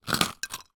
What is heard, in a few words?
human eat crunch bite biscuit cookie crumbs hit plate